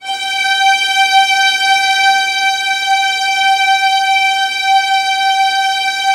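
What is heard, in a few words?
VIOLINS A5; ILIO; Synclavier Strings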